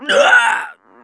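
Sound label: human middle male die, voice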